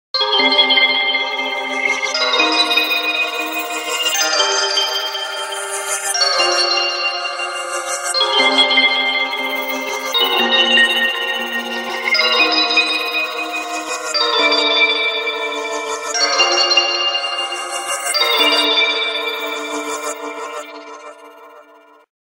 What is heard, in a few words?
Shuttle, Nokia Tones